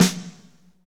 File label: SNR, Snares, Roland